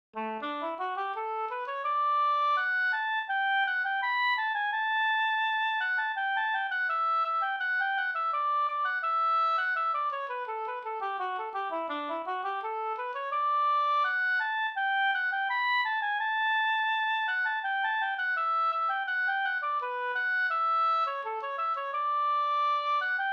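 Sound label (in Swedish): brudmarsch Brudlat Jämtland; Anders i Logarn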